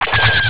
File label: tie shot